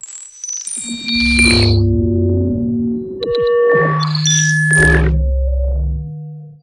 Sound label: fx eater long, Noise